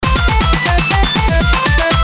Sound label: farts; lolicore